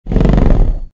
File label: Velociraptor - Growl; RadwinIsland